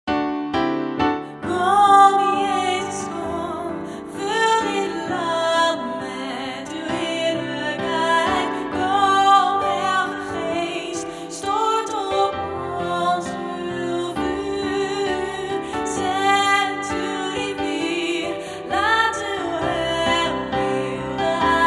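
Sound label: alto; Malieveld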